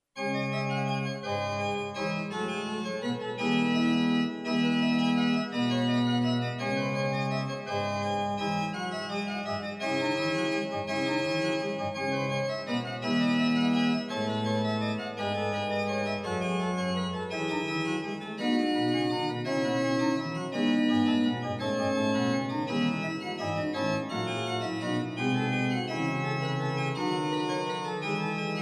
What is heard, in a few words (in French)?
orgue; Reducciones